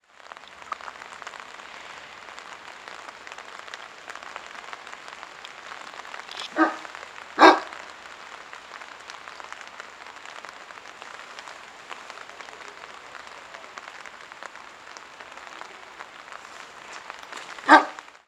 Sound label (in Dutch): honden, honden in de regen; binckhorstlaan; honden in de regen op het landje van "Jaap ijzer"